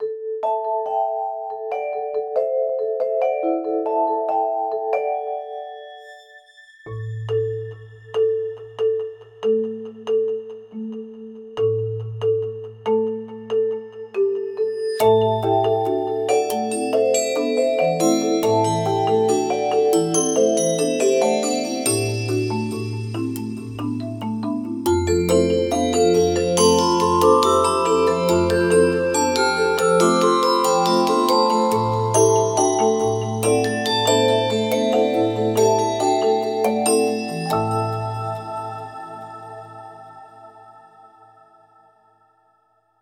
Vintage Series Mallets; Big Fish

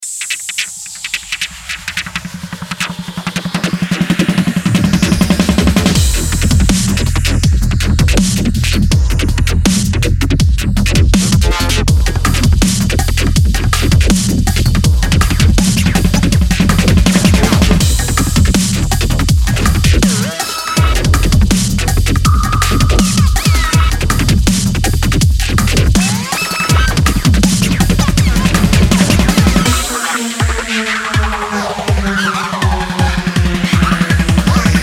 Dubstep Dnb; MASCHINE 2.0; Bonebreaker

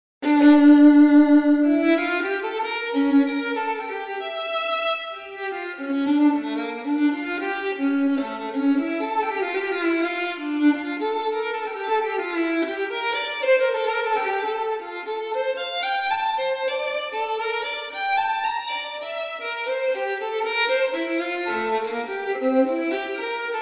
Violin Bach Partita; Embertone; Friedlander Violin

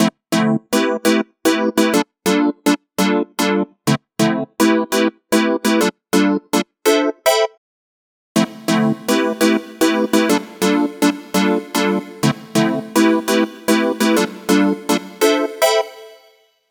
Radiance Synth Stabs; Wave Alchemy

